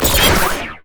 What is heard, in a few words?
droid move; LoL; viktor skin03